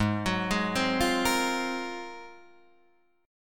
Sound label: Ab 13th down Guitar Open D